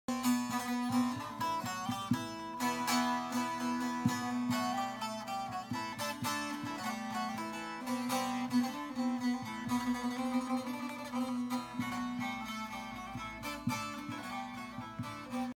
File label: saz